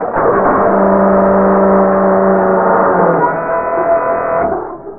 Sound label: gear; SciFiShipController